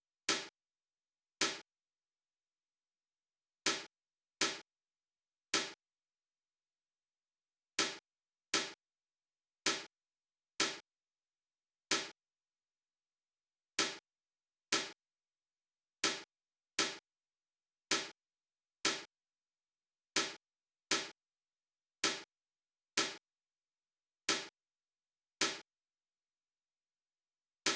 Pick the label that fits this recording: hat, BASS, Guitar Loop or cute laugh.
hat